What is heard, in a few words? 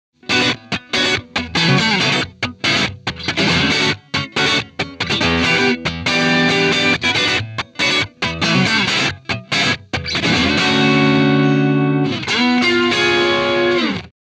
Guitar Amp Sound